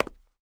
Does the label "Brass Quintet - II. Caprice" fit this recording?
No